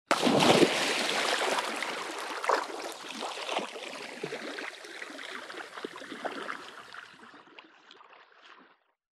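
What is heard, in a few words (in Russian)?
нырнул и плывет под водой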